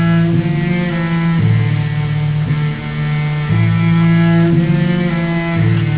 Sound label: bass